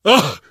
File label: hurt minor, survivor; voice; source engine; left 4 dead 2; namvet